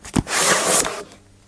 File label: book pul